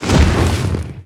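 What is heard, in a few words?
enemy attack fan fire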